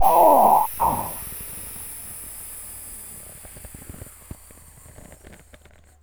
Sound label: death; sven; player; cleansuit